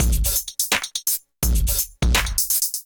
Head Smack Kit groove 84 bpm; MIDI Clips; Ableton; Drum Essentials

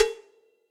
Cowbells; Direct Natural; Drums; Drum Hits; Ableton; Session Drums Studio; MPE Hits

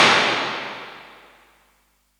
PLATE; Plate Reverbs; Convolution; IR Samples; 2s; Kontakt 5

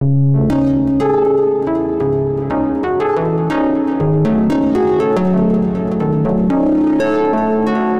Rubber Harp, Synth; Ableton; Factory Packs; The Forge by Hecq

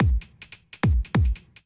Tops 144 bpm; Ableton; Factory Packs; Skitter and Step; Hyper

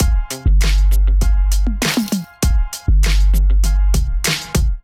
Shady Kit Groove 99 bpm; MIDI Clips; Ableton; Factory Packs; Beat Tools

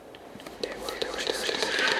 Dark Whispers, Disembodied Voices; Instruments; Ableton; Mood Reel

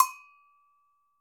Agogo B Mid, Latin Percussion; Drum Hits; Bell; Ableton; Factory Packs

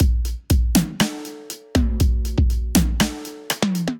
Kit, Drums Acoustic; Kuddy Kit

